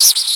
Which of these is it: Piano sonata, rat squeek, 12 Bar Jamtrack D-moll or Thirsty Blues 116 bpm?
rat squeek